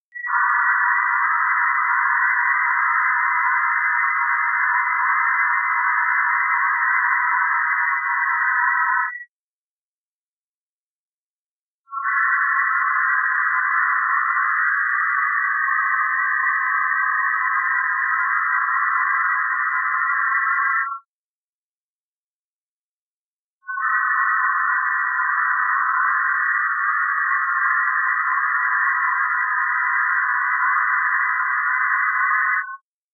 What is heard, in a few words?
slow scan radio logo in waterfall